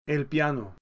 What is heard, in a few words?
piano